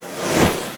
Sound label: Blow torch